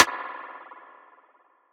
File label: Rimshot - Spring; Drum One Shots